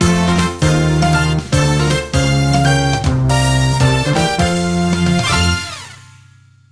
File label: Boss Clear Fanfare; SM3DL